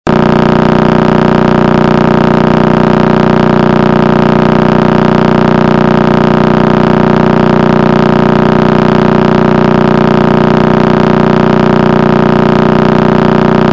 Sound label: crab, pulsar; astronomy